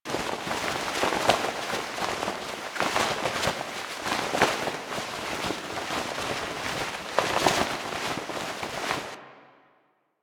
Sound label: Small Flag Flap Loop; Warframe; Ambience; NineteenNinetyNine